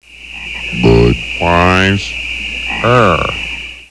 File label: bud frogs